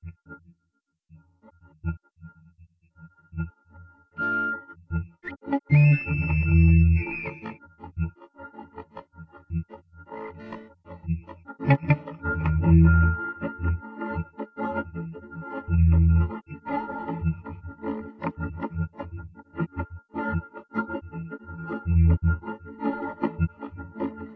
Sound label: synthe